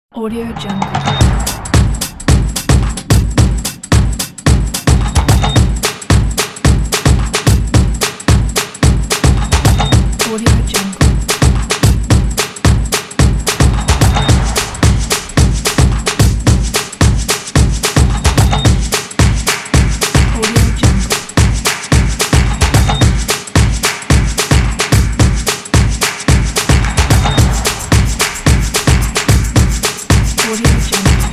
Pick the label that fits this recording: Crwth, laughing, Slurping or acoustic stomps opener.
acoustic stomps opener